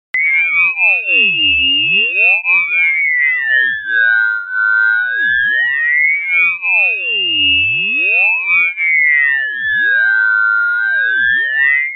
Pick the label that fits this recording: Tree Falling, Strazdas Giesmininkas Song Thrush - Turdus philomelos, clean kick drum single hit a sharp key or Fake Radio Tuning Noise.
Fake Radio Tuning Noise